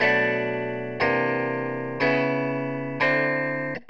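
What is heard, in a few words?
wet guitar chords, Multiband neural audio effect; Demo without amplifier; Wet-NoAmp; prism distortion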